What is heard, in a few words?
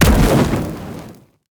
enemy burning treant special